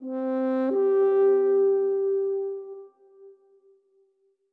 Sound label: orbit horns; Sky Children of the Light gamerip